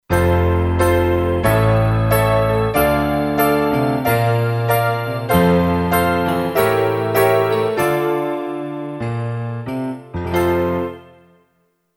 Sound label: Pop Grand Stack, Kurzweil K2500X; Factory Programs